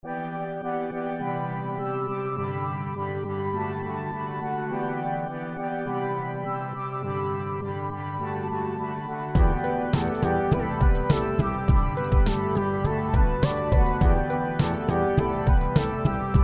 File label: POP